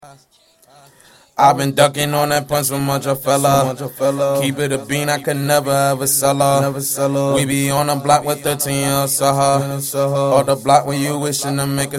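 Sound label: rap 162 D flat minor, acapella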